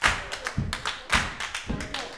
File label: HERE WE, SLAP STOMP; Spectrasonics; Vocal Planet; Blues Soul